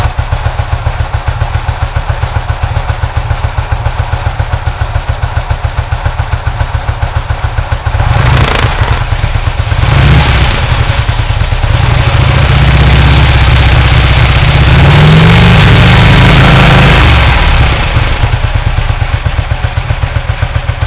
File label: Rapide Engine Running